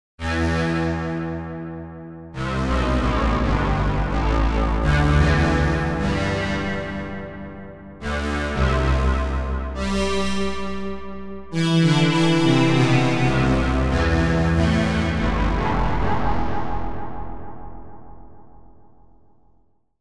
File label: Cosmic Keys; Cosmology